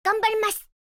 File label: click